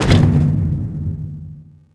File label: pallet hit metal; objects; Star Wars Obi-Wan; XBOX